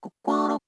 End, Keytone sounds; LG GD550 Pure

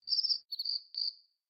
Bird Cicada Notification; Xiaomi Redmi 8A; Day Night

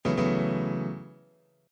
bounce

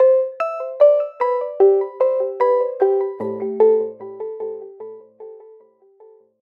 Shuttle, alarms; KONKA; SP6

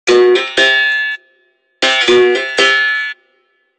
Berimbau, ringtones

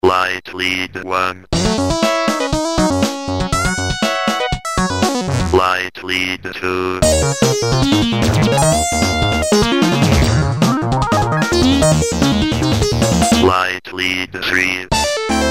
Lead 4op

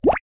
Water Drop Notification Evening

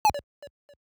Laser, notifications

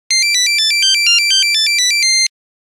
Etude, Nokia Buzzer; Windows Phone apps

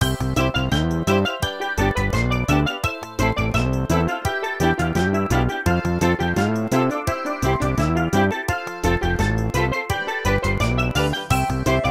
Canon, ringtones

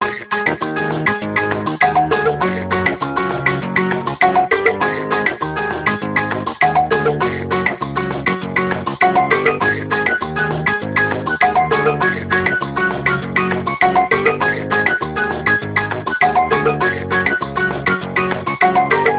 Jungle Salsa; Sony Ericsson W900i; VideoDJ

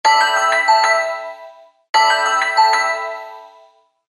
Gliter tone, Samsung SGH-i900 Omnia

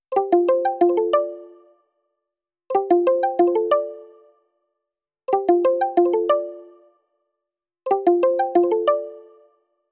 Kalimbalert; HMD Skyline India; ringtones

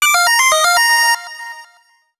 Alert; PlusFourSix; Patti; Lars Edvall

